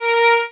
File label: violin